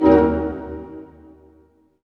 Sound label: HIT, Tutti Hits; String Master Series; Dynamic Orch